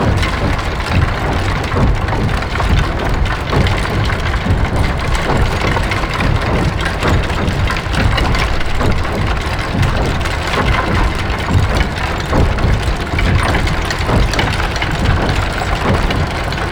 Orbital Station Mech Tunnel Loop; Warframe; Tau

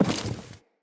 Walk Default, Footsteps; Enemy; Characters; Lady Deora

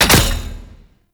Grenade Launcher Dry Fire; Warframe; Scaldra Grenade Launcher

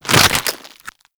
Infested Ancient Grapple Retract

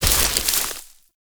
Door Branch Break; Warframe; Sacrifice; Shrine

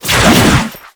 Overhead Long Smash Attack Whoosh; Enemies; Warframe; Grineer Nightwatch

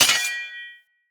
Short Sword Medium Parry Impact, Weapon Impacts; Player; Swords; Combat

